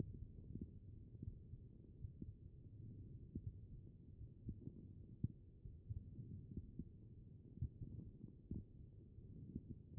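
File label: HEART, heart sound; B MODE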